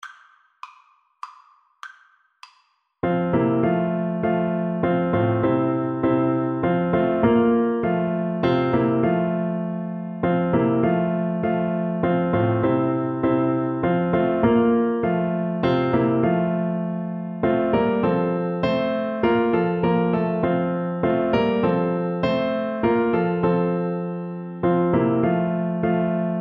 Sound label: Bass Clarinet